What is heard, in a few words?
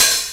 OHH, Roland JX-305